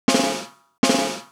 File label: Snare Roll; hydrogen